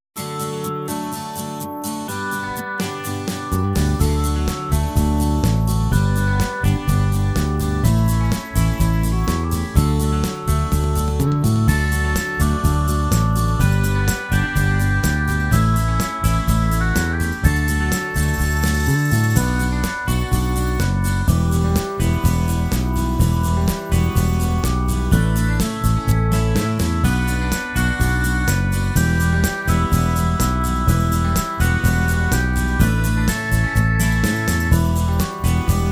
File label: Holy holy repeated backing; Backing tracks